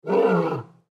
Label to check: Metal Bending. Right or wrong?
Wrong